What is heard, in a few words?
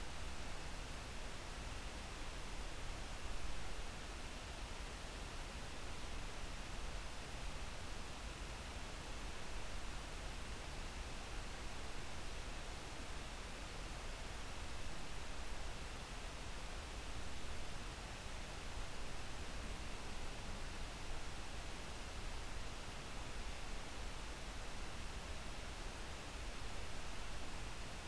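hydrophone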